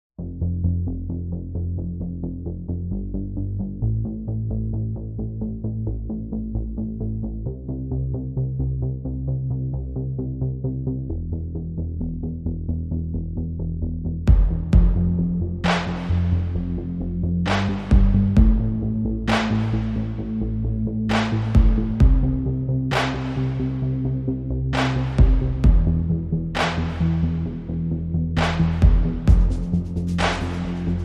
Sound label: Drums and Bass Mix